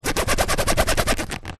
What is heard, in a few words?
luggage case small childs zipper fast movements; foley